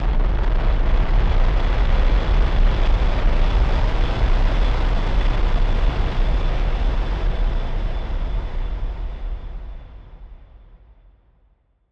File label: Noise Bomb Rumbler; musicradar; bomb factory